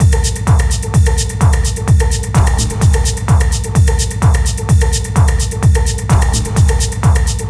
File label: Techno Drum Loops